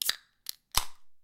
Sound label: can open